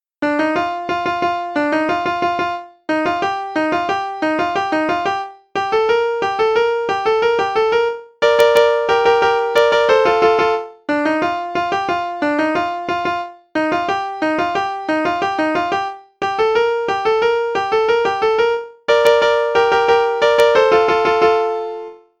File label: piano